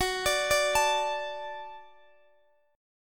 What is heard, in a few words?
F sharp Minor Sharp 5th F sharp Guitar DADGAD; F#m#5 arpeggiated; x,x,16,19,17,19